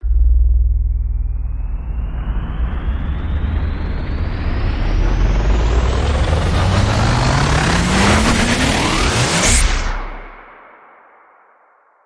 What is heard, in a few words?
Rise Long